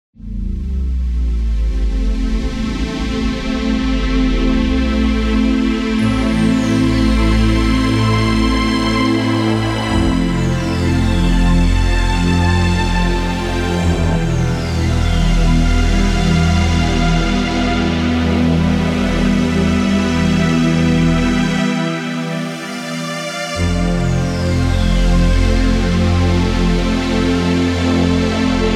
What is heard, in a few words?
tears ambient